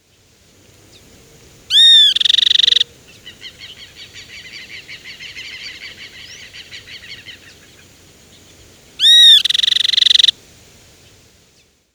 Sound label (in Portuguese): gibão de couro